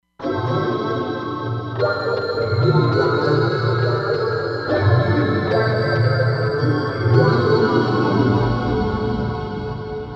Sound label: Roland Fantom XR; DreamInColor